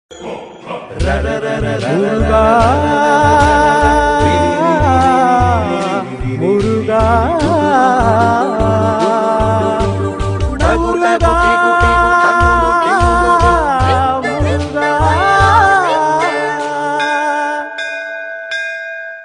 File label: tamil kadavul murugan bgm ringtone